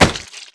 body medium impact soft; Garry's Mod; tf2

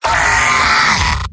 heavy pain severe; vo; tf2; mvm

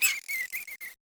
critter hit by flare; nightmare criters

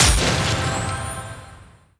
medal silver

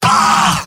demoman negative vocalization; tf2; garrysmod; mvm

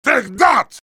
meleeing; heavy

